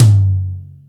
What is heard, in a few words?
high floor tom f sharp key; tom drum samples